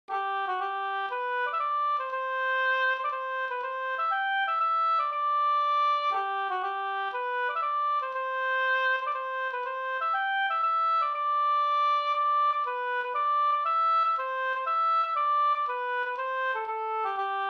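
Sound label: polska major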